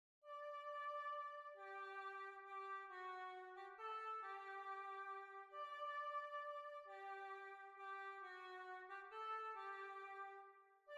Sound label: Tyrol Waltz